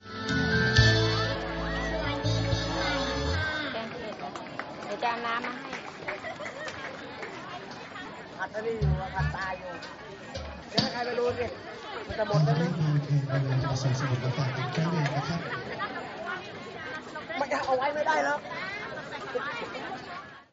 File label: New Year Party